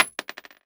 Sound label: 556mm shell wood; Escape from Tarkov